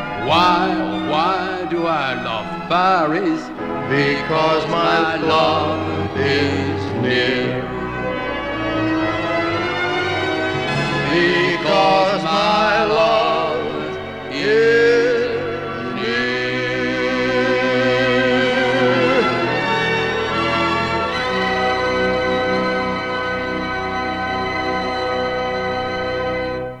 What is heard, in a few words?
Duet; UK